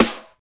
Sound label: solid snare single hit D# key, Royality free snare single hit tuned to the D# note.